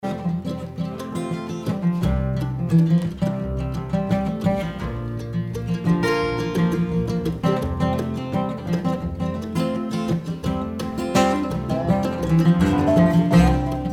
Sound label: Big Bug Shuffle